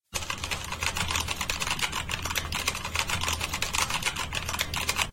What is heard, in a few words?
Typing experience Soft